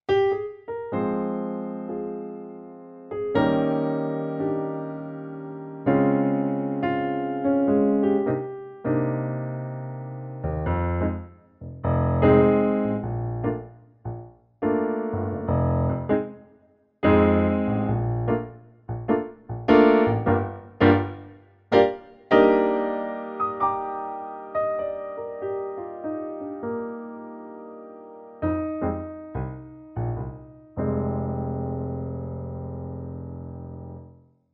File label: Yamaha P 515 Bösendorfer